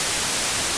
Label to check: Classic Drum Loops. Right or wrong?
Wrong